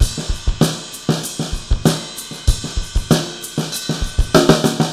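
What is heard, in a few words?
97 bpm, beat loops; Zero-G; Monster Beats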